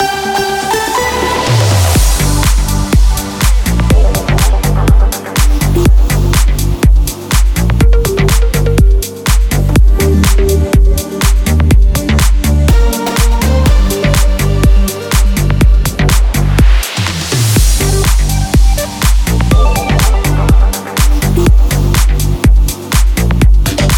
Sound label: Traffic; SWR3